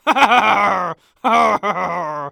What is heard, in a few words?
Human Male Pirate Laugh Voice